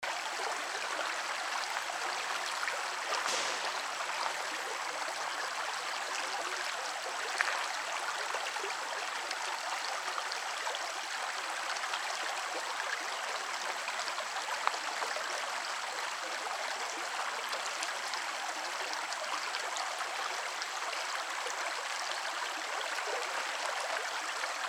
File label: water running creek